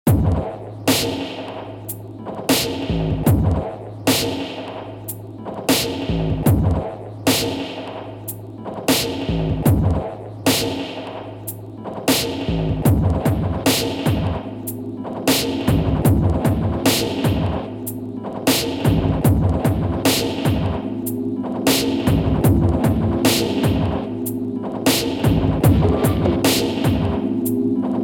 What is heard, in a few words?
Dub